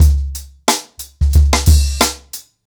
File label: Ripple Effect 90BPM, acoustic drums